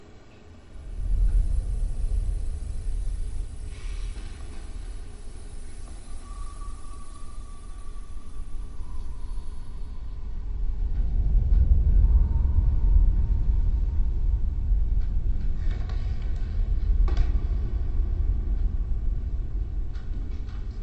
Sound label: industrial ambience slowed